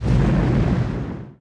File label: guard; RYL 2